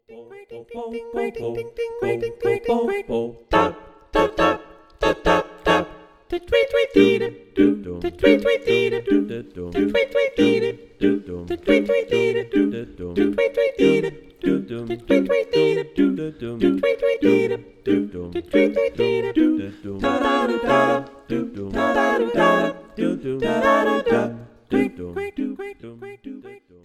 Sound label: vocal harmonies